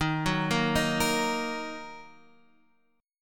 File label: D sharp Minor, Guitar, Half Step Down; D#m